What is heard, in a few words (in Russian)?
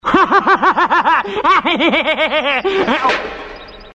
smeh